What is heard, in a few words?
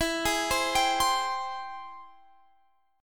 G sharp Augmented Major 7th